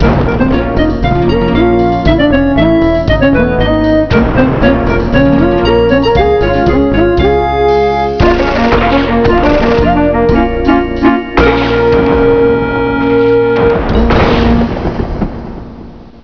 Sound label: VICTORY; Empire Deluxe Demo